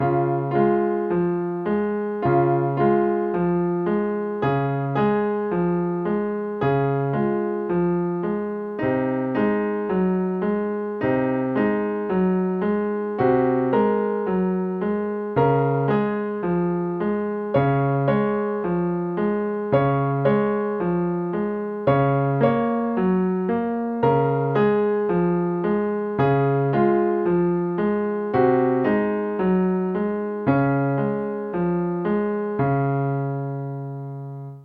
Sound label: alberti bass version; jacobs ladder